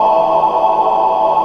yeti vox; Best Service Dream Experience; GALADRIEL

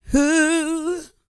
GOSPEL; Vocal Planet Vol1 Gospel

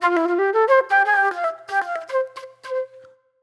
Flute, Disco

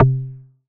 tom, Casio VZ10M Drums; Polyend; Simulacrum Sounds; 1 Shots; Synths

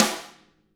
RIM; ILIO; Double Platinum Drums 1

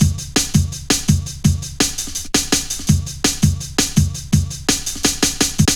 Fat Rolla, Jungle Drum Loops 166.5; Zero-G Jungle Warfare 1, 2 & 3

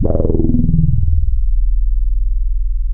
E1, Boom da Bass; E-MU Producer Series Vol. 6 – Analogia Project (CD 1)

